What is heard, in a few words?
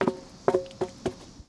Bouncing Tire; Second Ward; Houston; percussion